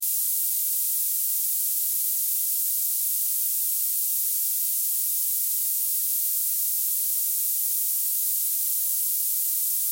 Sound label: noise hp